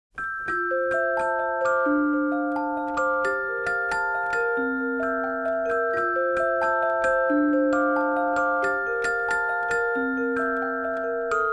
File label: Celesta